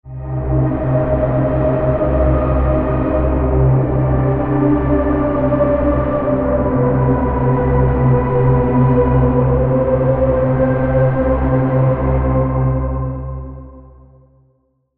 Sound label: Pad; Atramentous Cage; Routed Sound; Vital Expansion - Doom Carpenter Vol.1